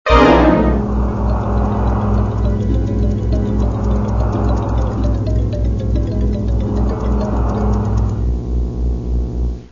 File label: Scary Music